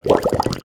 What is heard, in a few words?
bubble; breakhack